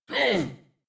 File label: Pain, en; Warframe; New War; Teshin